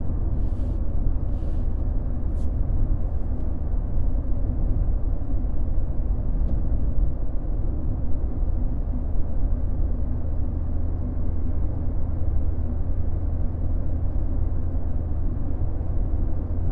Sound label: engine hum